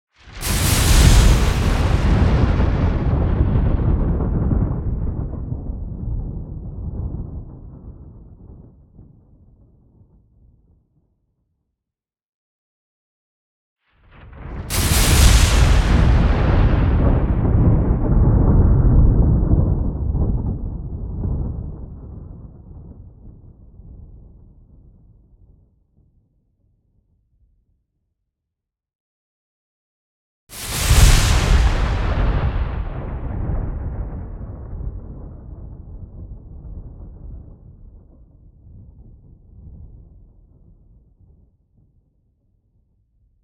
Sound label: Whipping Thunder Strikes Lightning And Flash Lfe Sweetener Artificial